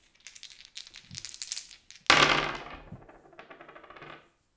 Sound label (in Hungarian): kockavetés, dobókocka; Perkupa